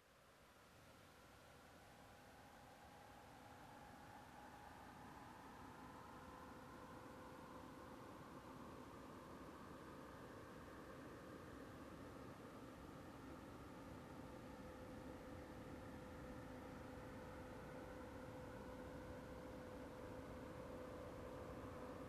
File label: Car approaching and parking